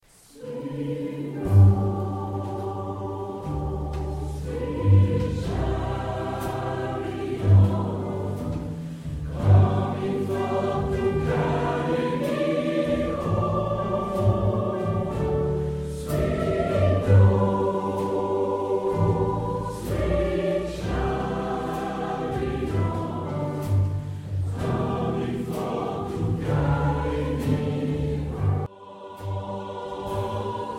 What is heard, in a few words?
Swing low; SWING LOW, SWEET CHARIOT; GOSPEL